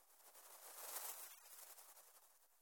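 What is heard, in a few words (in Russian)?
butterfly barely audibly flies past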